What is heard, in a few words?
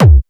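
Cool bass drum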